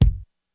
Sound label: Kick, Korg DSS-1 Drums